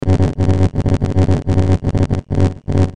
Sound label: Electrical Current Pulse; Blastwave